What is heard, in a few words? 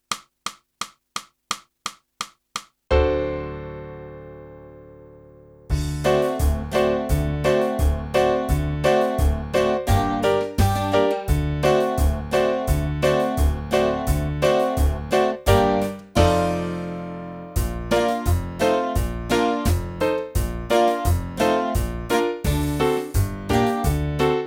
sax